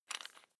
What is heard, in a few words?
electric drill bit unfasten wrench turn